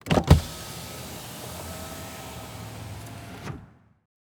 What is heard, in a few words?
Transportation Car Monte Carlo Interior Power Window Roll Down